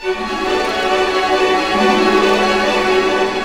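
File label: 128, orchestra; East West; Scoring Tools; Fantasma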